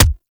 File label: Weapon Punch Shotgun; Warframe; Weapons; Shotguns